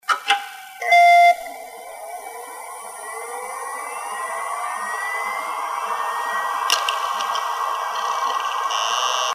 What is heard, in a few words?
startup, hdd seagate barracuda; dmax10